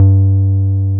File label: 303 SUB G3M, SUB BASS